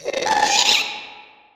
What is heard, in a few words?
charge; minecraft; mob; ghast